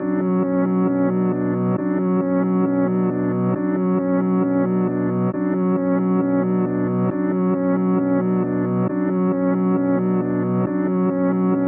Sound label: 135 BPM F# Min, Melody, Reverse; Cymatics; 2022 Melody Collection